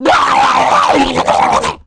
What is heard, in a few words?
electrocute; Messiah